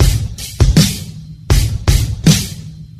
start and stop, Boombap Drum loops

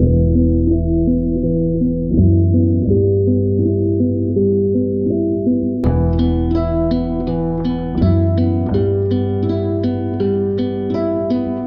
guitar

